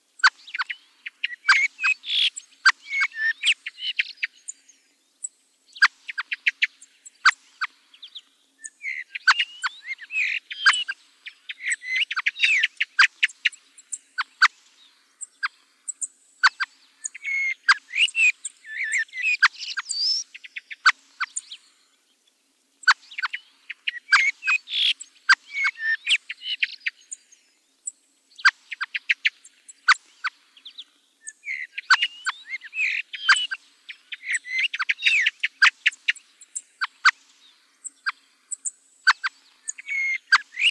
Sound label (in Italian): TORDO; CANTI SAG NATURE 2024